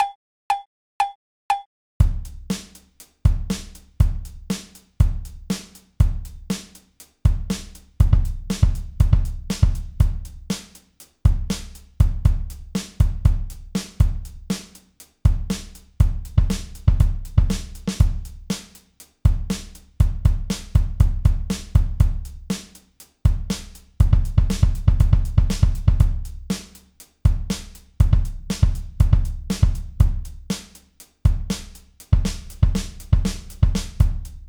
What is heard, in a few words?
tap timing practice bpm 120